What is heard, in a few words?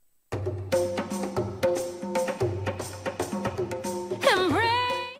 percussion